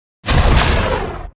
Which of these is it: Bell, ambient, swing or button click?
swing